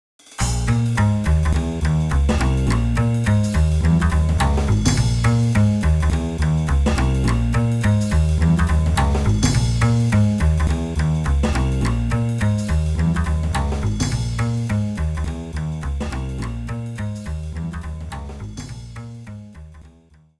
drum boss intro; Yo-kai Watch 3; 2016 3ds gamerip

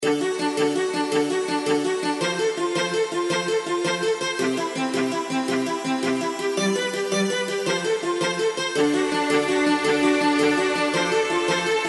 Music Main

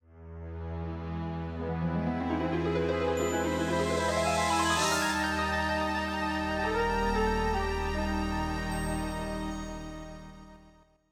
Fanfare, Roland SC 55

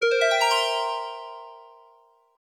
Hit; King Salmon; Genesis

